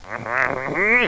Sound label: zombie attack; xash3d fwgs